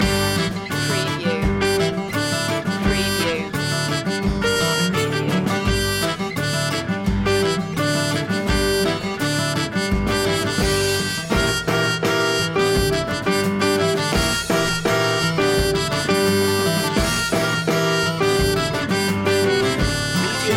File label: rag loop